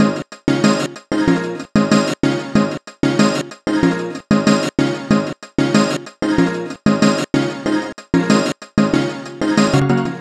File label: Beat, 94 bpm